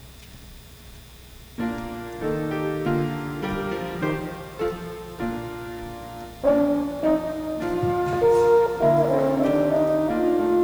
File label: Gavotte